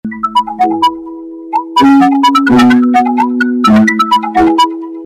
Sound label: tutorial music meme sfx